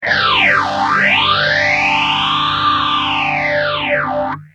gtr ibanez ph7

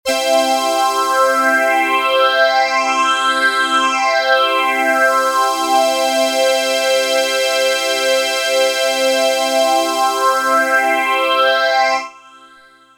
lambda master phaser

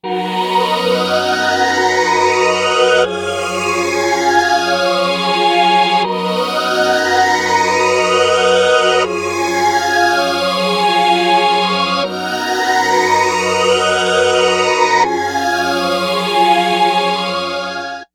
stringtron pt9pro

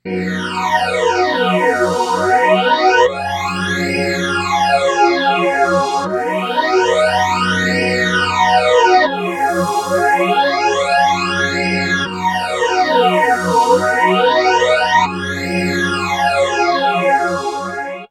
Stringtron, Moogerphaser

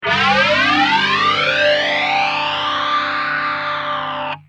gtr mxr fd

